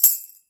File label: Tambourine